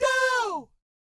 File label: DOW C5, Jazz; Spectrasonics; Vocal Planet Vol.3 Jazz Fx